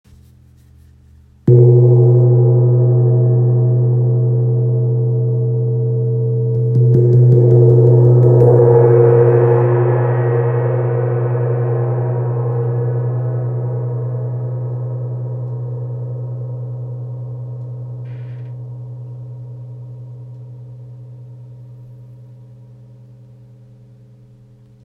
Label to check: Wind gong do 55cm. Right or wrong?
Right